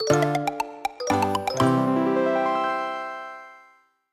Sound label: outro Free Spin; netent; flowers